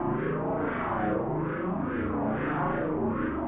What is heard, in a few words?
gun change idle, weapons